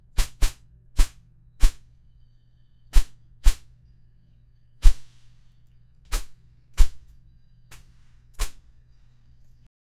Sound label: swing sound, weaponry